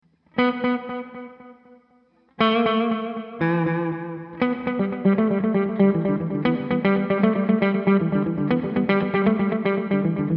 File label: delay